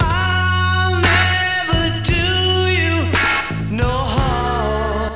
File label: pain